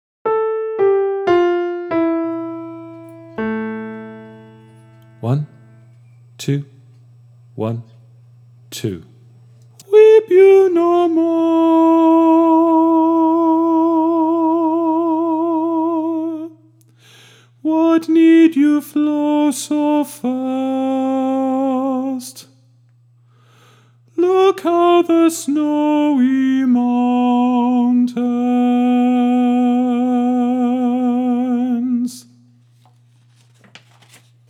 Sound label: Rest Sad Eyes alto